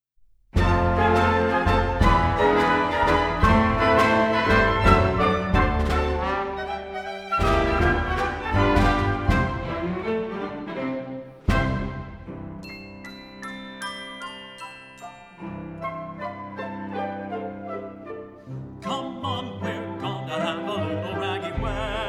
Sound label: wedding glide; hirsch